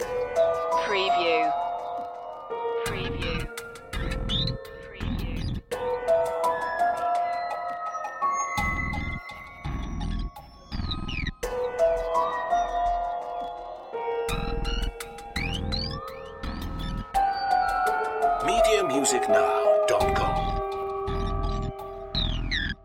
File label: zombie attack loop; Horror